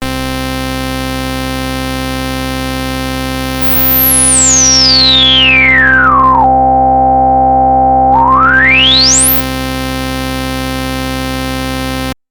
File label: Roland SH-101 Resonance